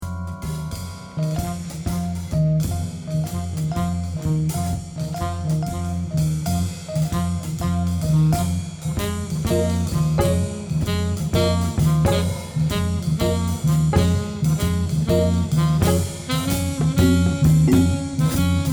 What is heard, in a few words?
blues